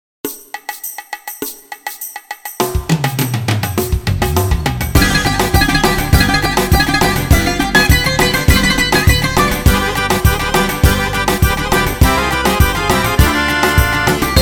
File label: Bouzouki Rhumba Intro